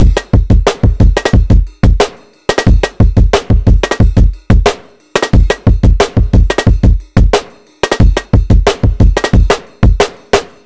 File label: Ride